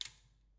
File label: Back Pedal, Bicycle